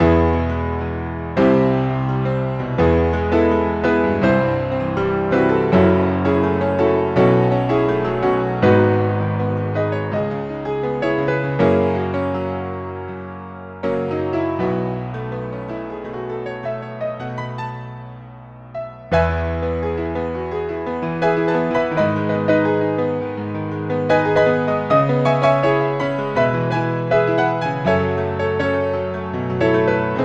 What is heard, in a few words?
piano